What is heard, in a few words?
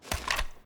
ads down; gmod